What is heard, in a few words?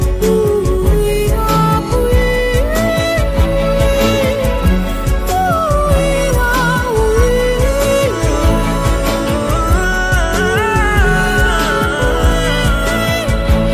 BG Humming Ringtones